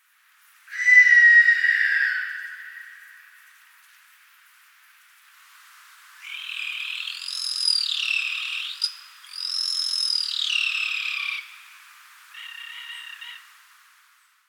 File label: Owl